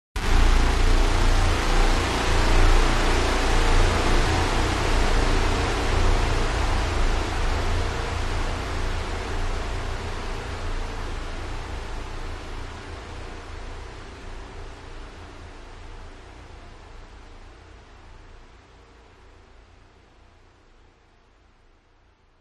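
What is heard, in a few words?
cinematic hit buzz synth dramatic airy; sound design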